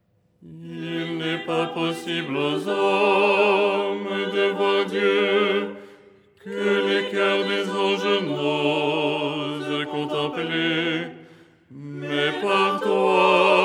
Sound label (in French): basse; 9ème hirmos du canon de la Pannykhide; Les 8 tons en français; ton 6